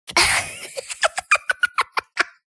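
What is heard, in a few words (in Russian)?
ringing laughter of a girl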